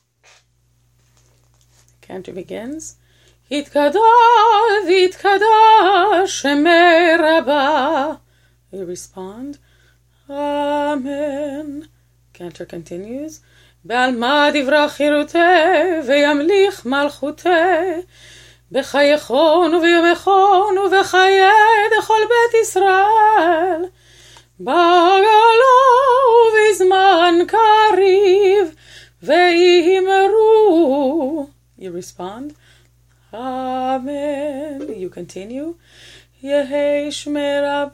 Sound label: Chatzi Kaddish